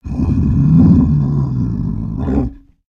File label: atk idle, creatures organic